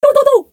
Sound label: NORMAL RODENT